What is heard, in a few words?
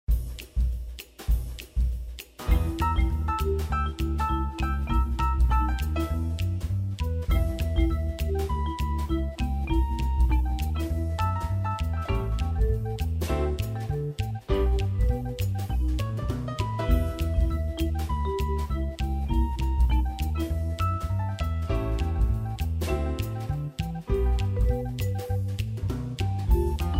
backing